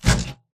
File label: bt footstep jog parts; Titanfall 2